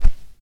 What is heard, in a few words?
heavy arrow; weapons